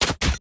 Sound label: reload, weapons; cstrike; valorant; singurality phantom